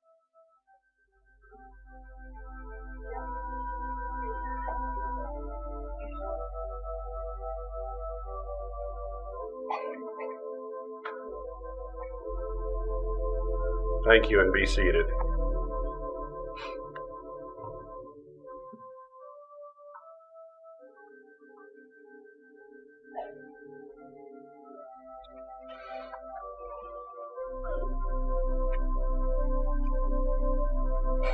Prelude Music; Utah; Funeral Service

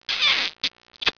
kiss; Flirty

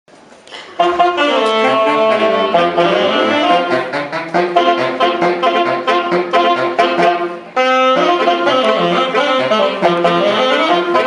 Quartet

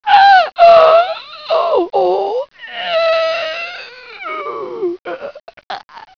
die

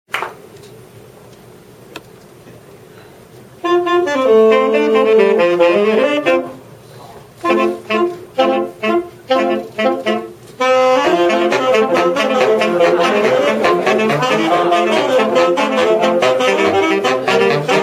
Just Sax

